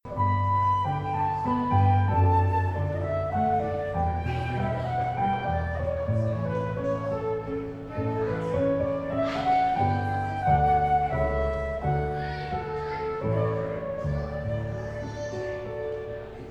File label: flute piano ceremony music live; Jazz Band